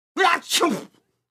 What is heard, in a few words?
Male Sneeze Pop At End